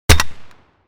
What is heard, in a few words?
12 gauge single barrel shotgun fire